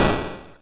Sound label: Drums, Hits; DCM8